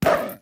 Wolf Lunge; Wolf Link

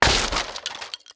Box Shatter

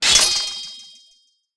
Press Start

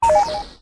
Portals Off

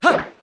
Backflip; Link

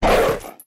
Wolf Charge Attack; Wolf Link

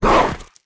Wolf Charge Attack; Wolf Link

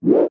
View Lock On

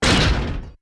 Iron Boots Step

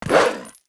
Wolf Jump Attack; Wolf Link

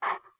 Wolf Huff; Wolf Link

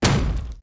Ball And Chain Land

